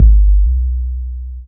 808s

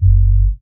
808s Sub

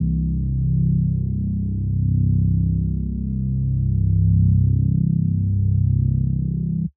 Bass

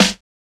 Snares

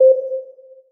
Synth (C)

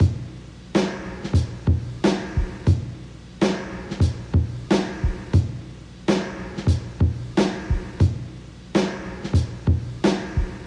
Drum Loop 90 bpm

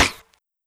Snare

